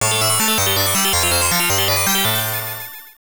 Bonus Stage Clear; Alien Crush; TurboGrafx-16

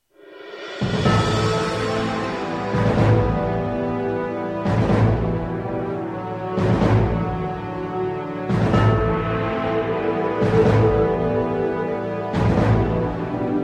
gameover; Air Attack HD

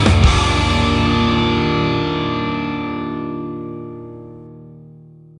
Mission Failed! Jingle; The Simpsons Hit & Run; PS2; Burning Rubber

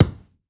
Tree trunk Collision; angry birds rio

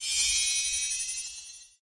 SPELLS Ready

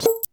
Shot

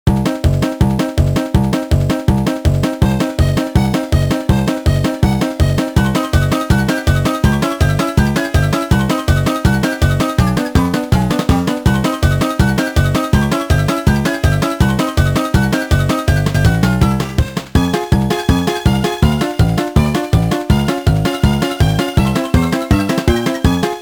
Boss Music; SpongeBob SquarePants Revenge of the Flying Dutchman; GBA

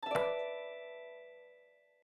Cross Bomb Awake; my cute commissar 2020 gamerip

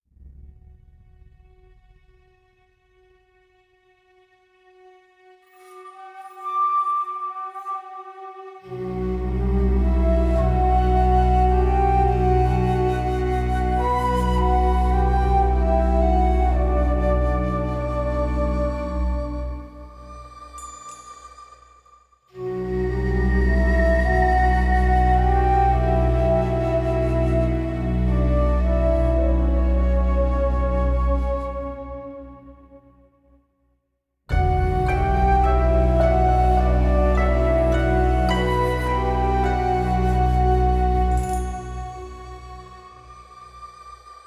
music; music 1-1 area 2

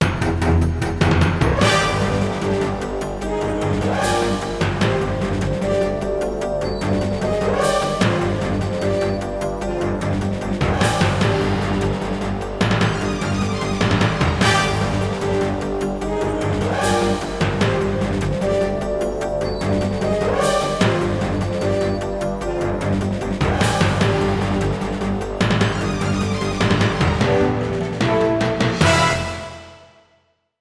Stereo - Tempo; SpongeBob SquarePants Employee of the Month

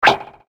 Shoot

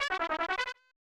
Get Something Jingle, snes; Esparks Ijikuu Kara no Raihousha

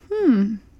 Emote Female Confused; Idle Champions of the Forgotten Realms

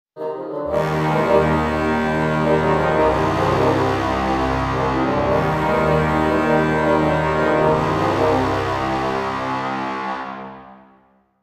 Lose Battle; heroes of might magic v gamerip 2006; Necropolis

